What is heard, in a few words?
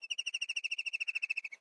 Amb Env Bird Chirp; Viewfinder; Botanist